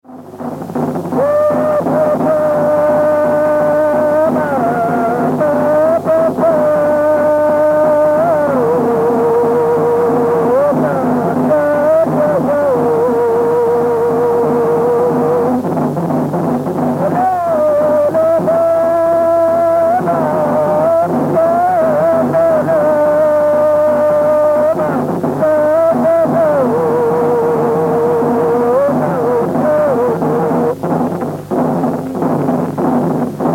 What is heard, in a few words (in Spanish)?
Canto de machi con kultrún. Canción de Nguillatún para bailar el choike; Dollinco, Lautaro, Chile; Música Mapuche